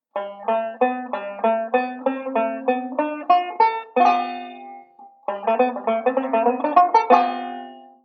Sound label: banjo; beyond chord melody volume ii